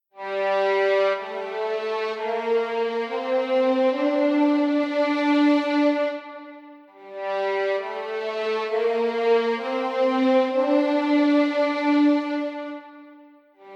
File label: First Violins Test Legato Reverb; LSS